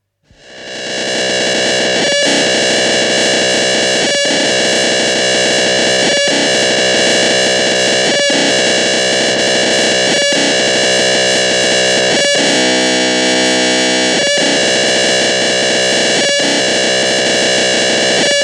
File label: Relay Satellite, telemetry effects from satellite; Miscellaneous Electronic; BBC